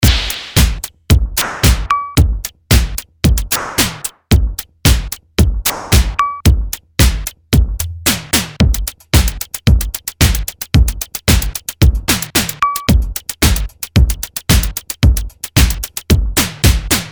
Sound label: Pattern Modular and Sampled Kit